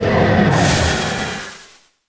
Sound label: Hero Logout